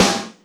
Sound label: Snr Nasty, Alesis D4; drums